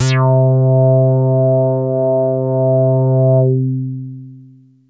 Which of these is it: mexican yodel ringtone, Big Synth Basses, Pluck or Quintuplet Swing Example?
Big Synth Basses